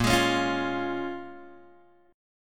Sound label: Bb Minor 7th Bb, Guitar, Open G; x,3,6,6,6,3